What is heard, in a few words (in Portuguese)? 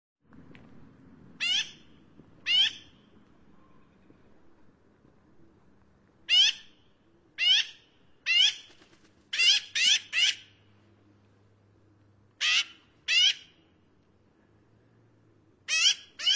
canto do Pica hudsonia